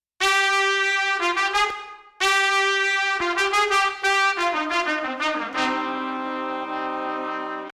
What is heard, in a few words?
lead